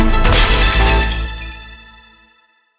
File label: large Win; netent